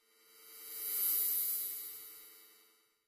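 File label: Electric Buzz By Pass; buzz & hums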